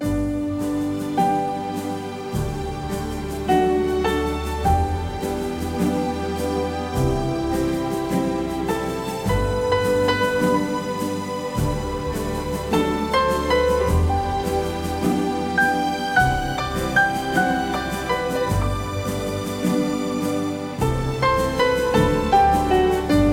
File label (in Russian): БАРАБАН